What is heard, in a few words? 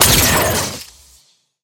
metallic crash; soundscrate